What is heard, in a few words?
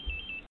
nokia 1610 ringtones ringtone